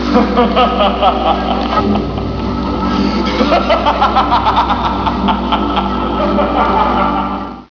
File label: crow laff